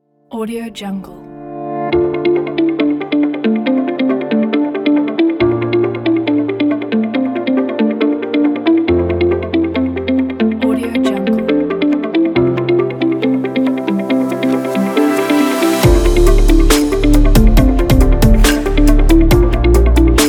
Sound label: inspiring corporate technology